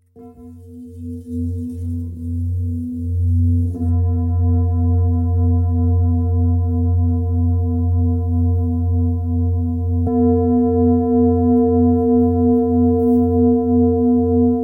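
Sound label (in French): Bol ramgandi mi, bol chantant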